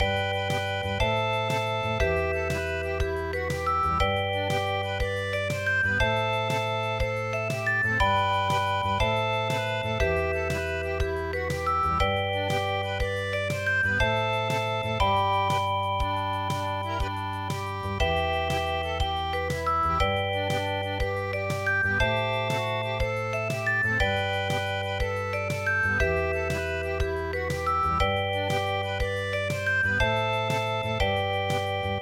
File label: paris musette; Waltzing Matilda